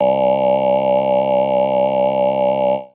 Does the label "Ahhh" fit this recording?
Yes